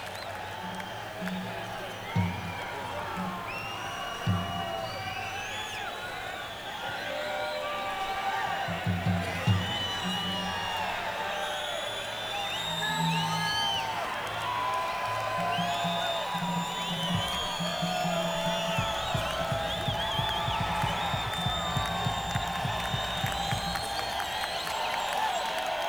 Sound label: Crowd Tuning